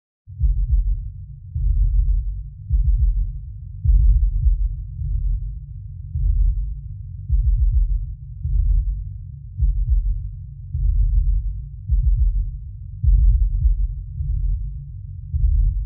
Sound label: Lowpass 150 Hz